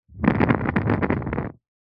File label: nature fire flame candle blow out